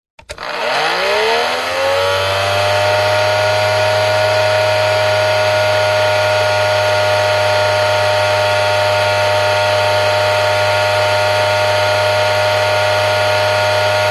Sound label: industrial pump electric handheld on off long burst close to motor powerful